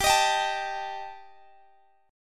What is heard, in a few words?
G Suspended 2nd Sharp 5th G, Guitar, Open G; chordshape; down